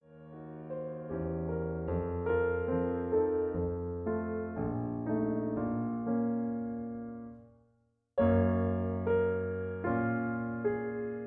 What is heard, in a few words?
Accompaniment B flat; 4 Serious Songs Op 121-2 Ich wandte mich und sahe